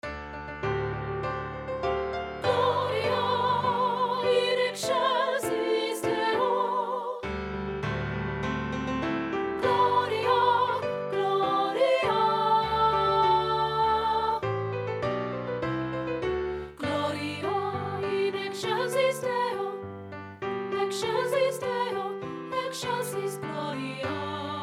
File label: A FESTIVE GLORIA SOP2; treble; partbypart; SSA